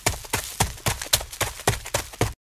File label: Footsteps running on dry leaves